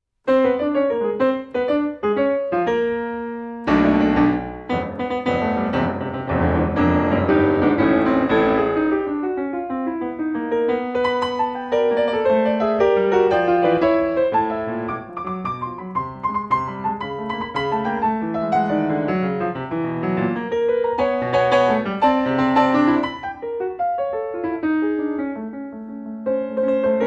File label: piano